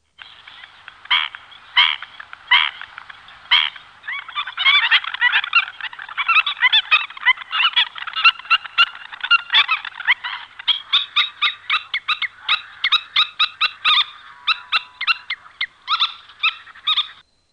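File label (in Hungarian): Gólyatöcs; Madarak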